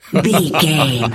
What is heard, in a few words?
voice male laugh